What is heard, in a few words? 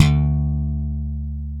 D-1 SLAP, SLAP BASS; AKAI S-Series CD-ROM Sound Library VOL-7